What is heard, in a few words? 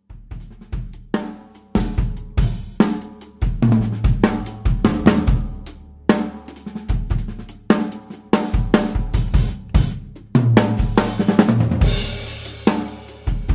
big band groove